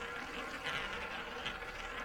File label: ball Loop, roulette; europeanroulette3